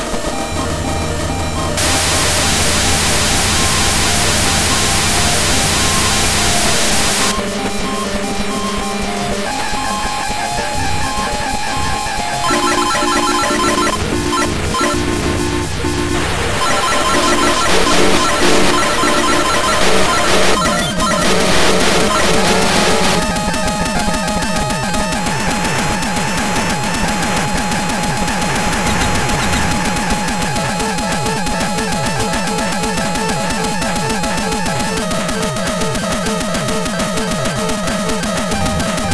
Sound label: save state noise